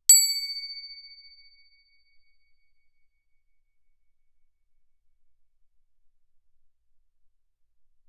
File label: crotale Db7 ff; Percussion; crotales